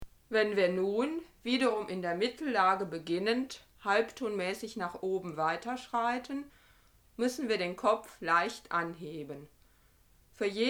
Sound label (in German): Fuenftonuebung aufwaerts